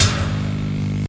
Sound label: machine on; Weird and Interesting Hits